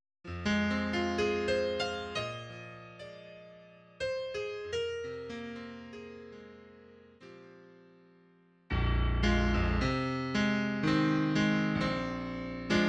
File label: Triade, piano